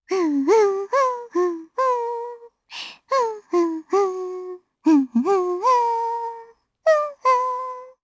Hum, jp; Estelle; kingsraid

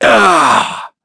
Vox Damage; Shakmeh; kingsraid

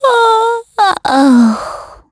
snoring; Gremory; kingsraid

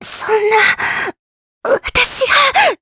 Vox Dead, jp; Cleo; kingsraid

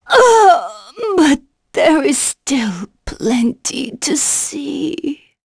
Vox Dead; Shamilla; kingsraid

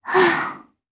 sigh, jp; voices; heroes; Frey; kingsraid

